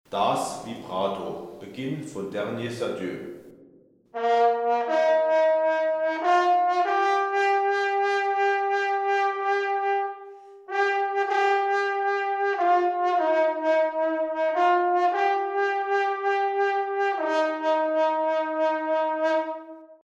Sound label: vibrato